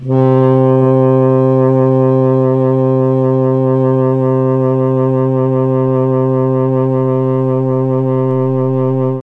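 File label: C4 tenor sax, mellotron; CHMB TNR SAX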